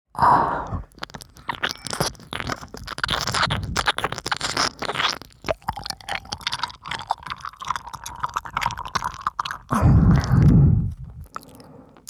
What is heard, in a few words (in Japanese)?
右耳舐めループ用; 小林さん